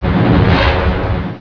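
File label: FIREBALL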